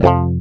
F, Acoustic; Sound & Vision; Gigapack I CD 2; GUI ACOUST.; GUI Acoustic 1

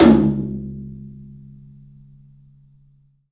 TOM, Low Toms, 3D Percussives

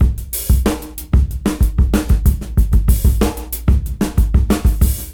Busy Break 93 BPM; AMG - Keith LeBlanc Phat Butt Jams

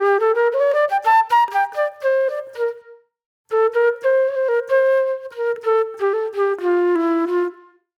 Reflective Flute

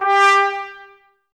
BRS, Woodwind Brass Ensemble; Miroslav Vitous

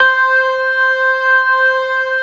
ORGAN SYNTH; InVision Interactive; Keith Emerson

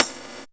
percussion, Dance Synths; Mad Kit

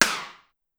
Rim Down Fast, Clavia DDrum-AT, Pitch Env; Pitch Env Various

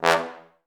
TB STAC E1; Best Service; Peter Siedlaczek's Advanced Orchestra

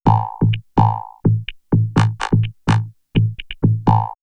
Vocoder Loop; X-Static Goldmine; VocoderLoops

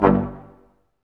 BRS Quintet staccato, Roland; Roland L-CD702

